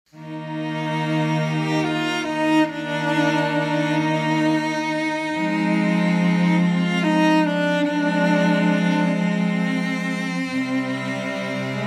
Aus der Ruhe Strings; Abend; Hymnus

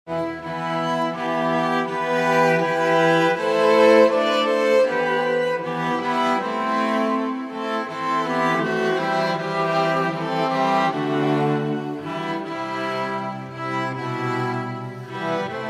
Gesegnet seien deine Wege STRINGS; Lied

